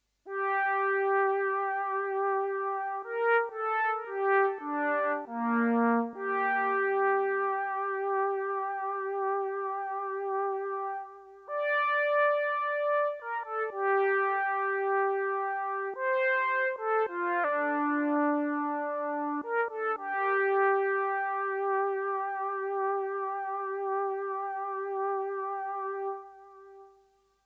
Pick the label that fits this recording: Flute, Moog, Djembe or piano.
Moog